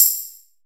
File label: Open Hihats, Cymbals; Vengeance Samples